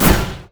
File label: arcane barrage; LoL; ironforge xerath